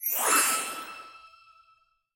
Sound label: Spawn Special Candy; candy crush saga gamerip